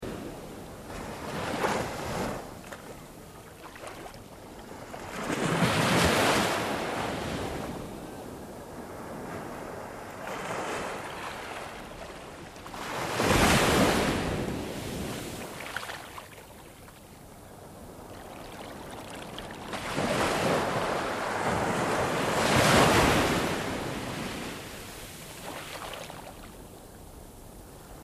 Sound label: sea; SunsetBeach